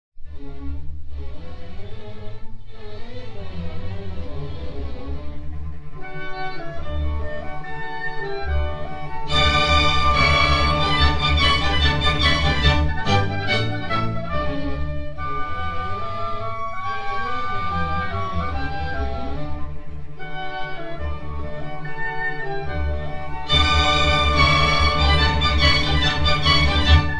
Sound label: orchestral classroom; reverb demo